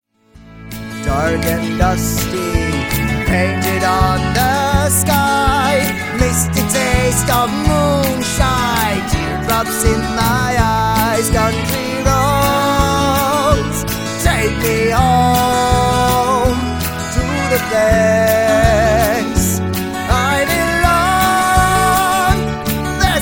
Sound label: Singing